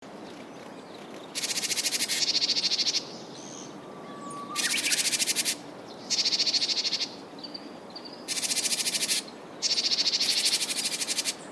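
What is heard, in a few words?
sizyuukara, great tit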